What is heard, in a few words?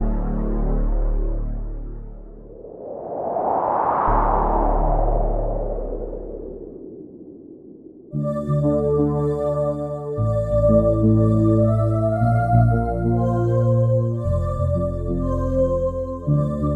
Celtic Ambience; RSK-30; Recursos Soundfonts